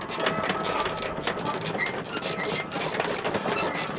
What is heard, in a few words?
Artillery Run Loop; Civ4 beyond sword